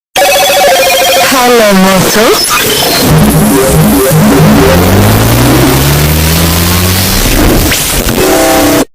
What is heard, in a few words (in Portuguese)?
HELLO MOTO ESTOURADO MEME